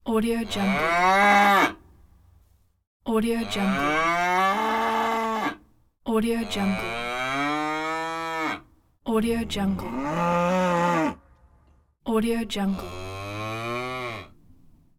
cow moo

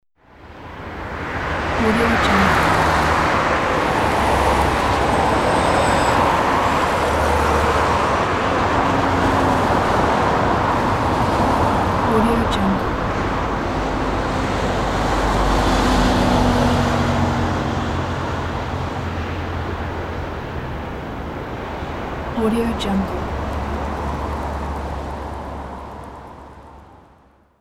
urban city car traffic

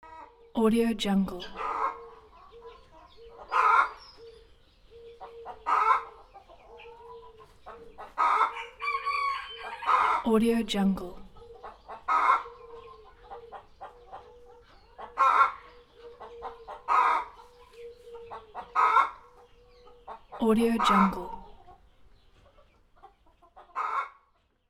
chickens and rooster village ambience

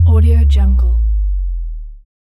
sub bass drop

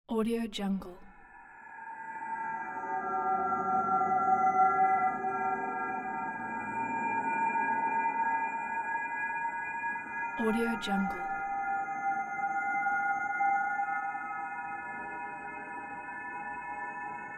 dark atmosphere

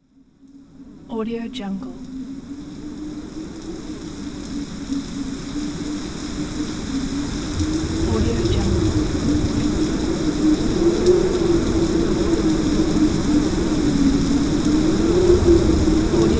spooky wind rainstorm with thunder

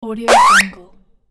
cartoon whoosh

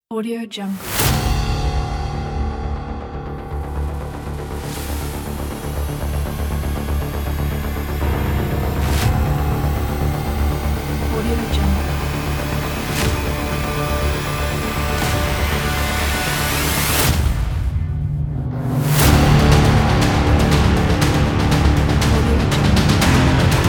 action cinematic trailer

